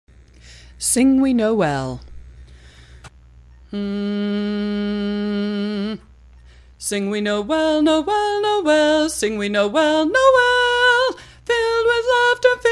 sing we noel tenor

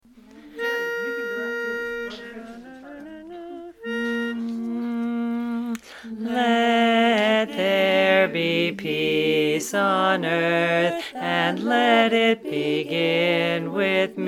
let there peace bass

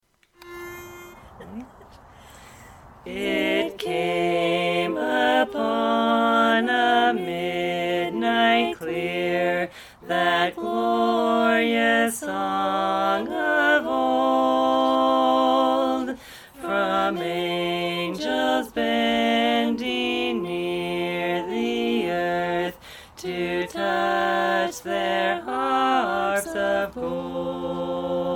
carol medley bass

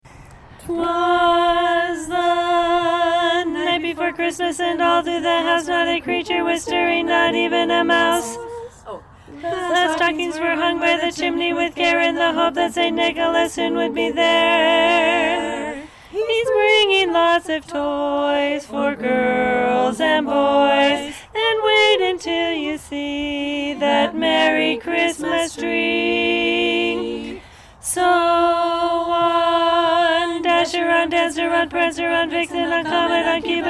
christmas chopsticks lead

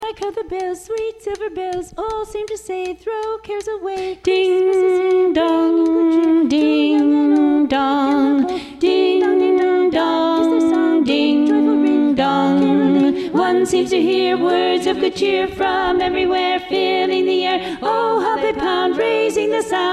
carol bells lead

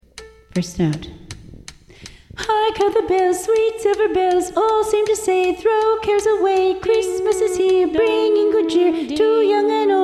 carol bells tenor

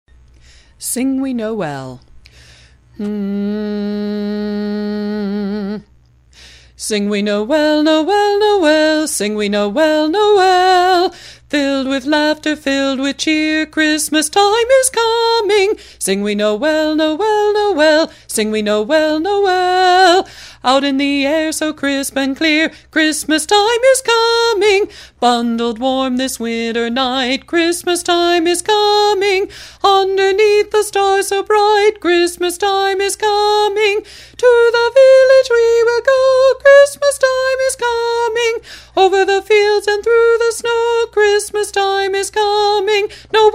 sing we noel lead

